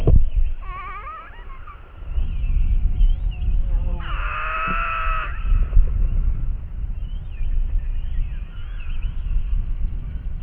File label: male rock hyrax vocalisation; eurekalert science